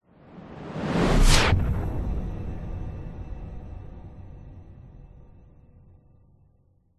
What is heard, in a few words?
cinematic whoosh hit collapsing explosion by long tail; sound design